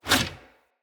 Bow Melee Whoosh; Weapons; Envoy Hunter Bow

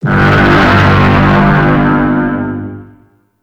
Turret Detect; Duviri

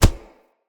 Unarmed Punch Impact Flesh; Weapon Impacts; Player; Combat

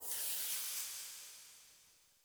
Tarot UI Card Slide Out; Warframe; Harrow; Tarot Emotion Choice

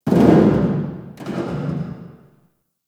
Metal Fall; Ambience; Warframe; Corpus Railjack

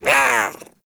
Pet Speak; Enemies; Warframe; Catbrow; PetShip

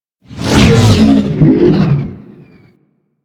Fighter Ship Close Short Fly By; Space Fighter Crp Ship Engine A

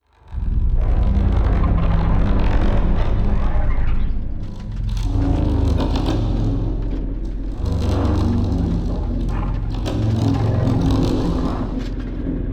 Vision Foley; Synod; Bromius

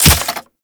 Ghoul Crutch Step Dirt, Footsteps; Warframe; Grineer Ghouls; Ghoul Drill Sergeant; Foley

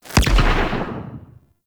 Operator Void Melee Punch; Warframe; Focus; Operators

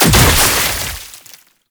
Ranged Weapon Projectile Explosion; Boss; Enemies; Abilities; Warframe; Oraxia

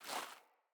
Grunt Boot Walk Gravel, Footsteps, Boots; Enemy; Sinecure; Melee Grunt

